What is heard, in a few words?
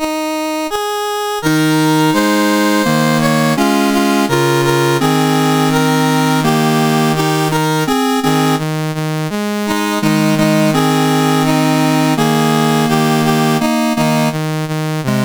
Swaziland, National Anthems, CSG8580R5; Dave Marquis